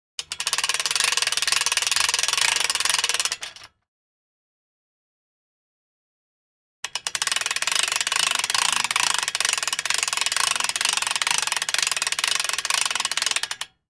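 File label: hand winch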